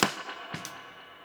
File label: Rim Spring; One Shots; Touch Loops; ClassicBreakSessions; Sprung